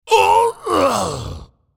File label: Minotaur dying